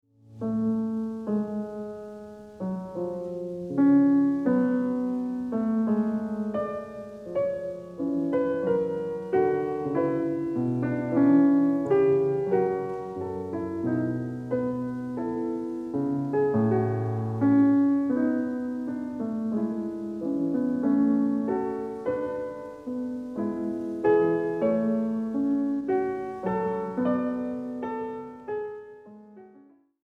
Well Tempered Clavier Book II Prelude & Fugue in f-sharp; JS. Bach